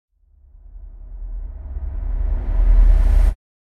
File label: Reversed Impact Sub Danger Hit - Severed; Hollywood Edge; sound designer's toolkit v2